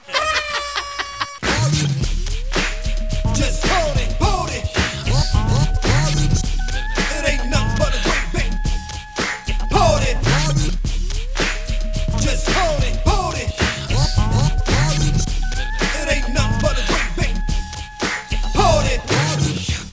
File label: 8bit rap